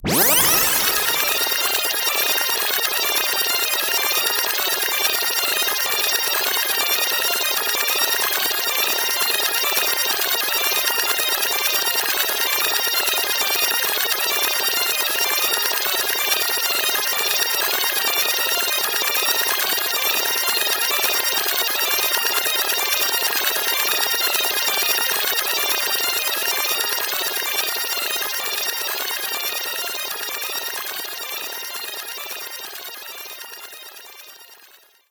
Jingle rew Ff; Blinx 2 Masters of Time and Space; Xbox

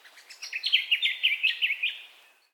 bird call; children of morta

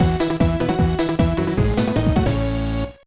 Jingle, gba; Backyard Baseball 2007

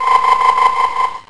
Ice Beam; pokemon reborn e19 2022

